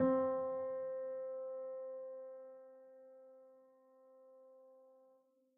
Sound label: piano sampler